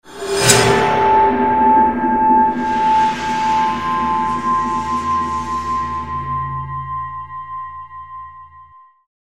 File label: Fanfare; Kopaka Fanfare; The Legend of Mata Nui; Console Games; Game Music